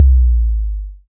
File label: BD, Synth; Sound & Vision - Gigapack II CD 2